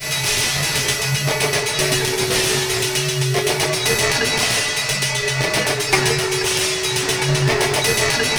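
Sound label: CYBER DRUM; Best Service Advanced Media Trax Building Blocks; WAR GAMES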